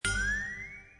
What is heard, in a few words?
unknown instrument